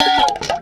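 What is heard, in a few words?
WASHEE BEL, percussion metallic; Rarefaction; Poke in The Ear With A Sharp Stick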